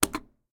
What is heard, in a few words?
metal light